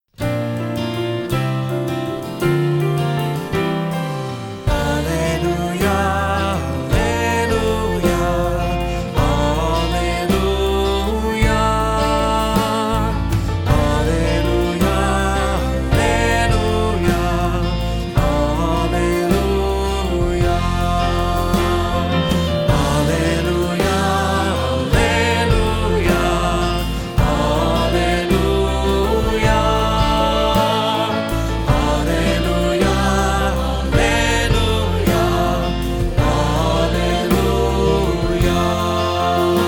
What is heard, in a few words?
Gospel Acclamation